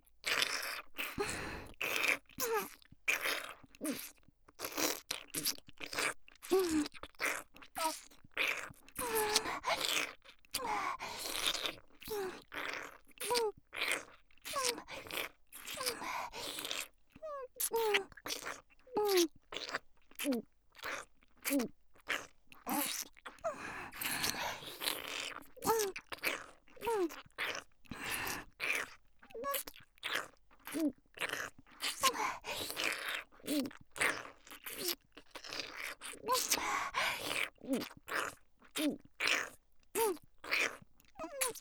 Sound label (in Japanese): フェラ音(激しめ)